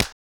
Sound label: Clap; Ed Edd n Eddy; Adromulus Pack; EEnE